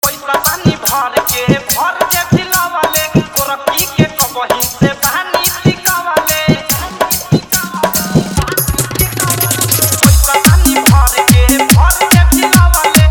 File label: Dhollki Bass